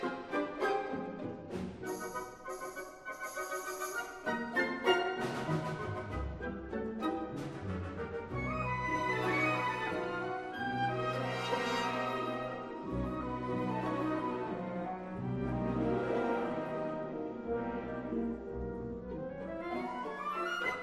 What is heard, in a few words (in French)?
danse générale; ravel daphnis et chloe; ii. les jeunes filles attirent daphnis